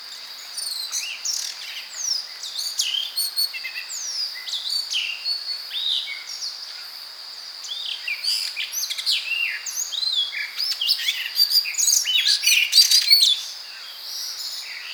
Blue Rock Thrush Monticola solitarius

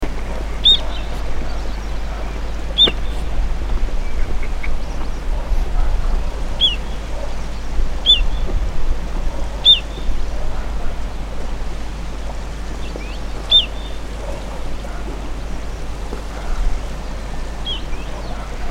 Long billed plover, call

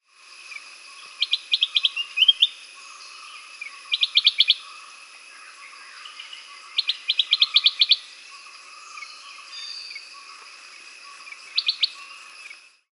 Flame throated Bulbul

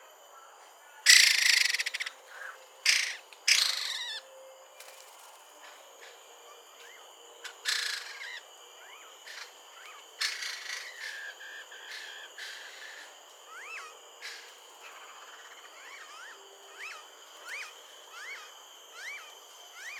White crested Laughingthrush Garrulax leucolophus

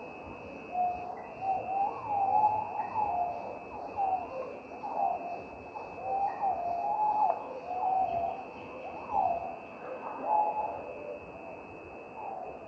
Pin tailed Green Pigeon Treron apicauda, call

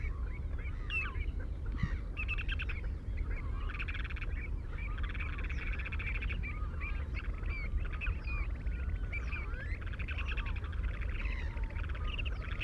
Ruddy Turnstone Arenaria interpres

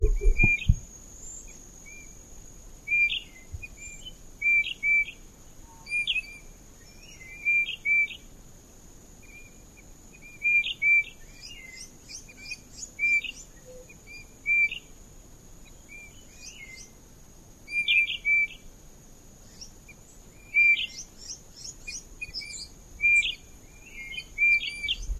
Brown Bullfinch Pyrrhula nipalensis

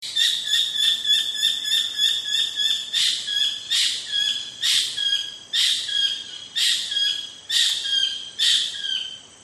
Black capped Kingfisher Halcyon pileata

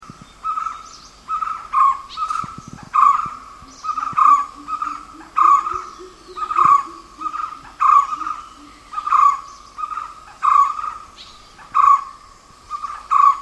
White cheeked Barbet

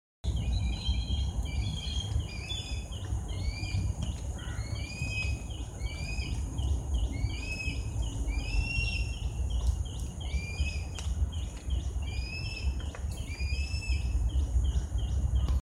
Black Kite Milvus migrans

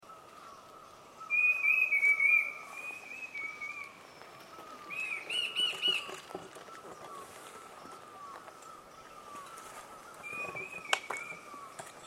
Cachar Wedge billed Babbler Sphenocichla roberti

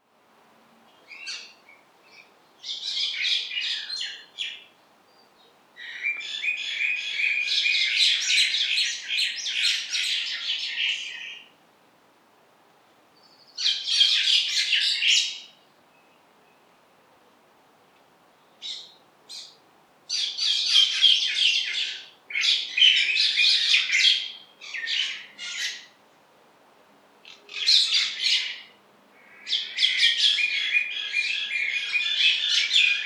Common Myna Acridotheres tristis

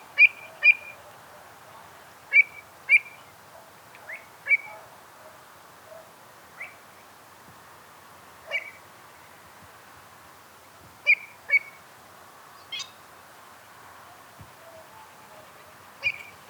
Pied Avocet Recurvirostra avosetta

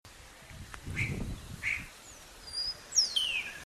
Common Starling Sturnus vulgaris

